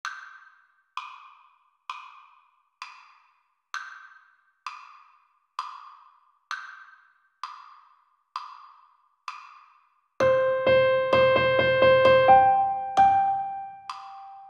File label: 65 Melody Only, uke